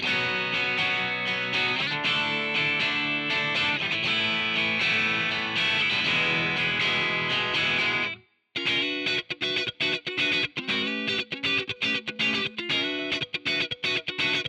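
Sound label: guitar amp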